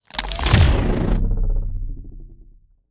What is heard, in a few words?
atk stone roar